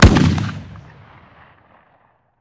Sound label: shotgun fire atmo ext; gmod; viper